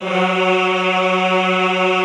EHH F#2, Classical Choir; Best Service

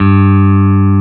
BASS G2; E-MU Formula 4000 Series Vol. 5; Protozoa; Proteus1 Presets

